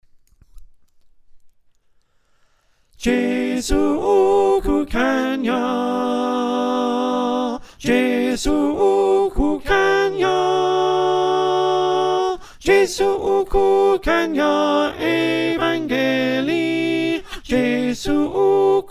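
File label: alto, choir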